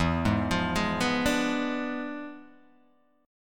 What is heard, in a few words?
E 7th Flat 9th Guitar Open G, chord